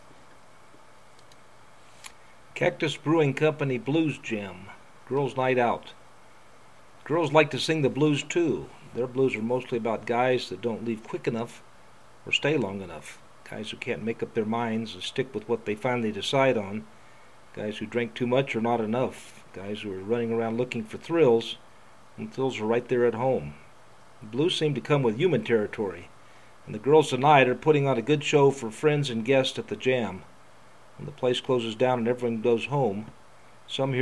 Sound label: blues jam; kaktus brewing company